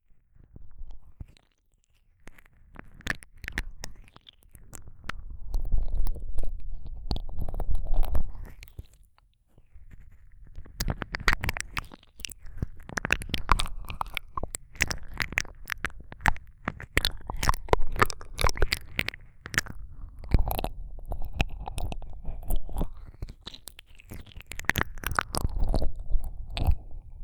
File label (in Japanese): いろんな耳舐め, ASMR